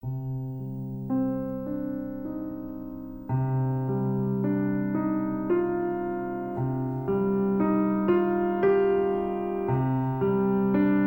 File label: Relax Music